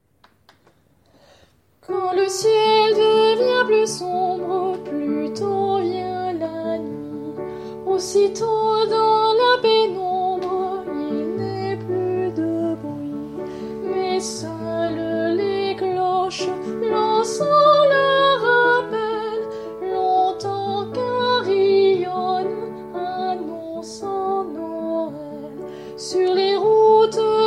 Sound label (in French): Noel anglais voix